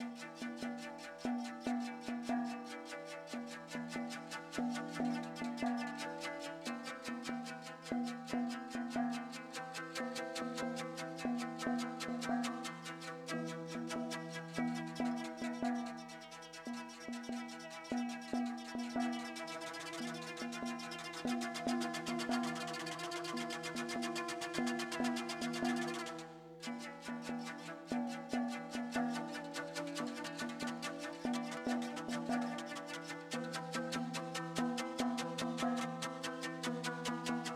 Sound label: Music Neutral; Sifu; H2 New Level Area=Post Patio